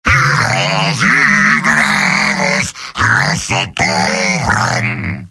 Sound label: singing; heavy; video games; source engine; tf; mvm